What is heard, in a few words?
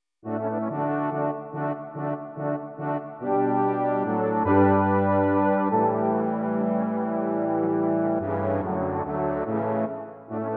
Menuetto K575